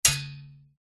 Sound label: foley metal spring med ping flick hit tight; zapsplat